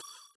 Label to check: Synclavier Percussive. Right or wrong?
Wrong